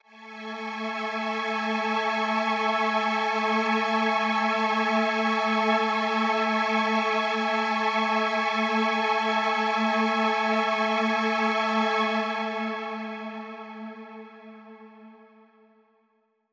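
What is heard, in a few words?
Mach String A major, synth orchestra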